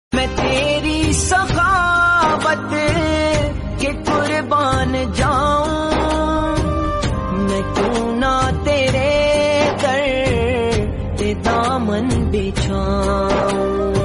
naat shareef

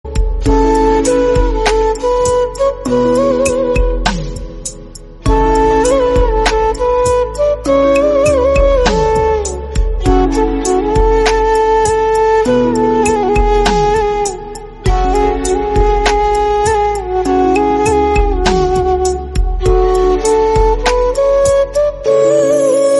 flute version

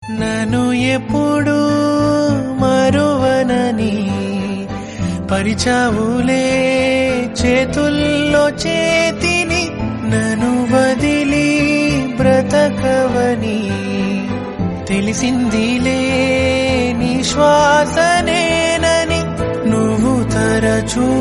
song, telugu; baby movie

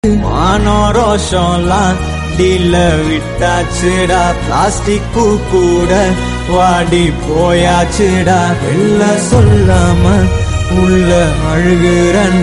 telugu song

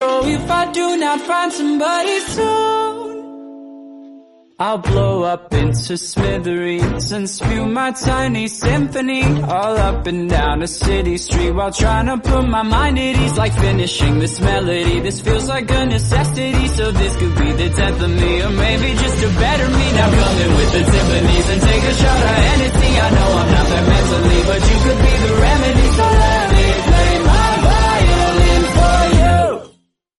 worlds smallest violin

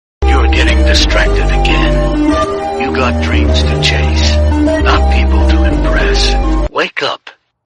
instrumental bgm

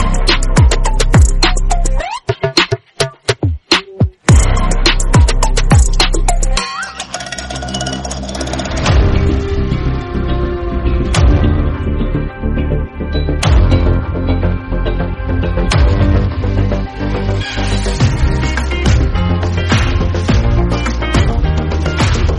instrumental

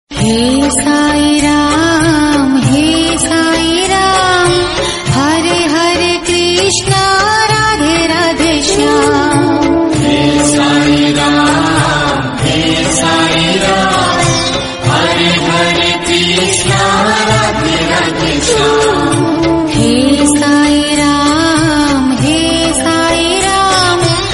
female version hindi

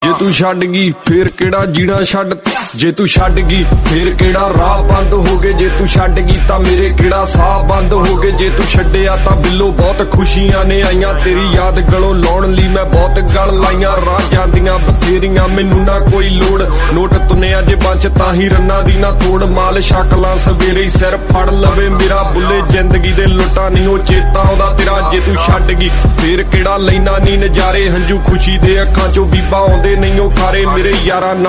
harp

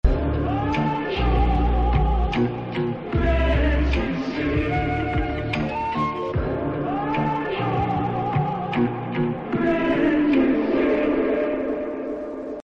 remix background music

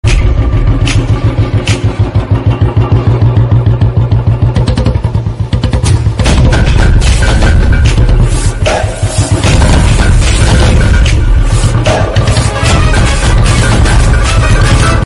bullet bike sound; bullet shell

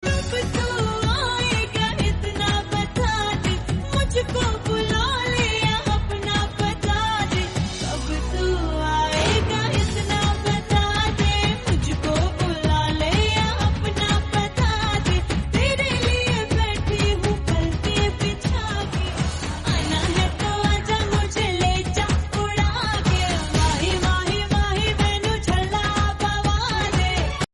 hindi song

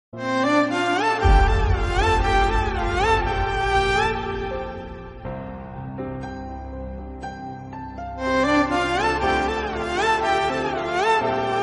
bgm theme

